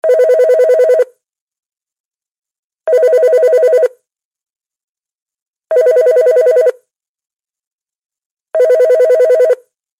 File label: Basic tone, ringtone